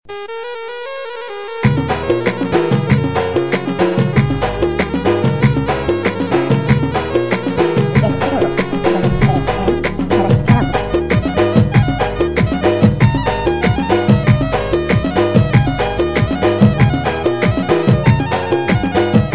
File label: arabic music; jazz